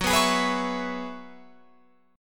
F sharp 7th Suspended 2nd Suspended 4th, Guitar, Open F; strummed; F#7sus2sus4; 18,18,20,18,19,20